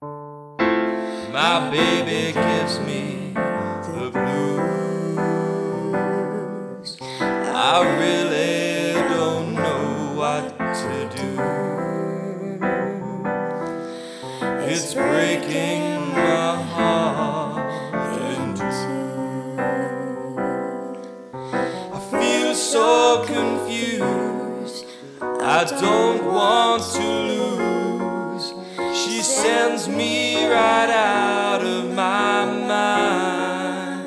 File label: lounge jazz duet; the ship murder party